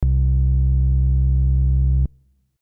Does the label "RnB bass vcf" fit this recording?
Yes